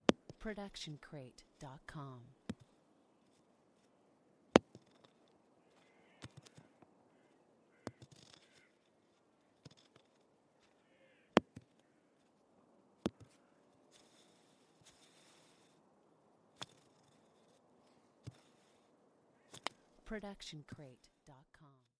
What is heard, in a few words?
golf ball falling and spinning; mono; soundscrate